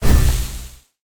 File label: ui force retreat